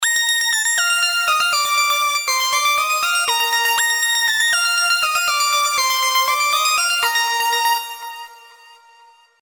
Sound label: Gleam Ringtone, Synth; HTC; Synth 1